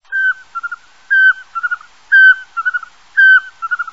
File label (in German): sperlingskauz